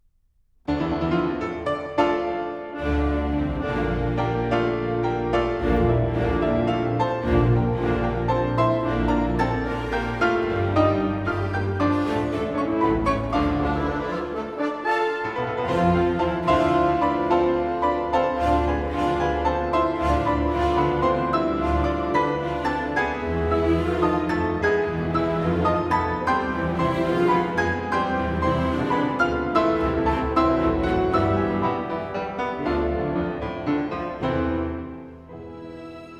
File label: Piano Concerto No. 2 in B flat major, Allegro appassiona; Op. 83; II. Allegro appassionato; Johannes Brahms